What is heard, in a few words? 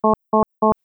Medium, alarms; J Edworthy 2019